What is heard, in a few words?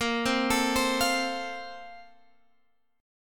Bb Major 7th Suspended 2nd, Guitar, Open G; 20,17,19,17,18,x